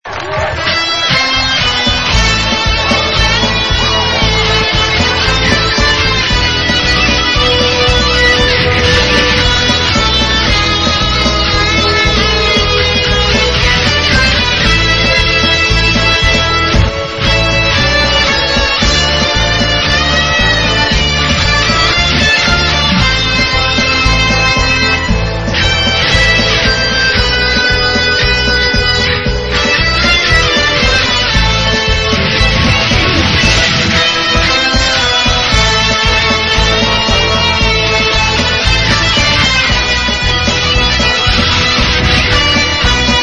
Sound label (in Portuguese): Hino Do Sport Clube Corinthians Guitarra; Comemorativas